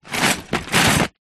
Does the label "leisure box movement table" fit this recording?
Yes